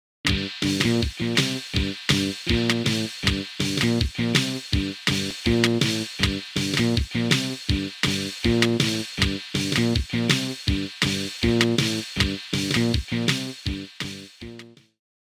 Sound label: Level Transition; Mineirinho Ultra Adventures 2017; Miner Ultra Adventures